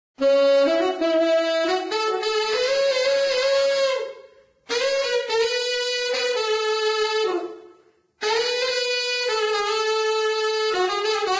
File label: Saxophone; Zomboid; Lifestyle